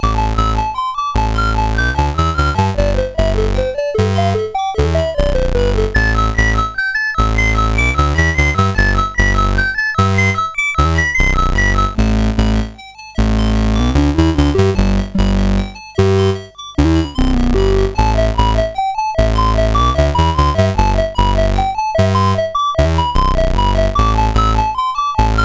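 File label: Magicians Ball, MOS 8580 SID chip music, games; CSG8580R5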